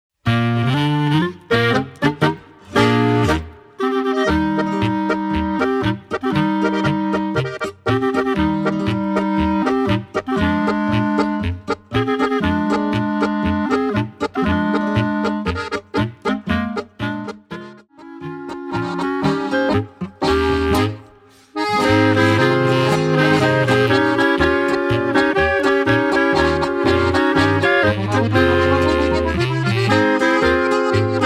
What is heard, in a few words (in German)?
Polka